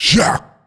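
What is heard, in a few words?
mob boss attack; Monster; Bossmob; 쌍면마개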